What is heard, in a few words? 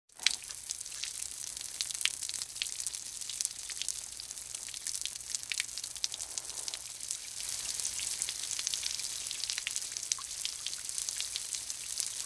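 Medium Water Sprinkler, hose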